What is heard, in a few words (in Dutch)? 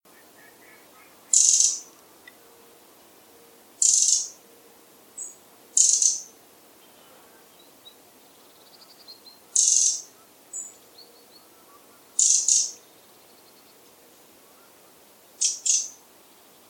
winterkoning alarmroep